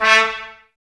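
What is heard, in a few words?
Brass trumpets A, Mute; Miroslav Vitous; Woodwind Brass Ensemble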